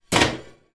household glass chopping board place on metal dish draining rack